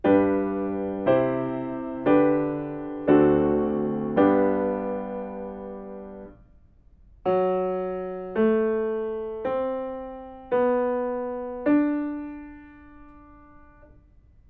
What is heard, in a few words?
melodic fragments